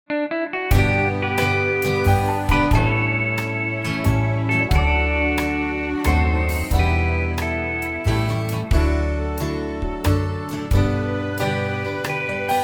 D waltz

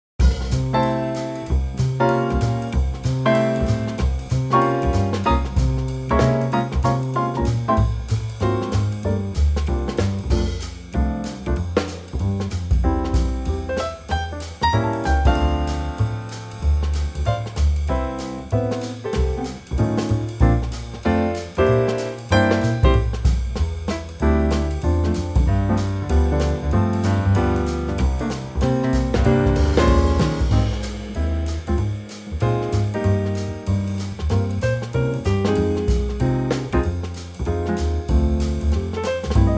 Trio; F Trio; key - F (bridge Ab, chorus in C, key change to Db) - vocal range - C to F (optional Gb)

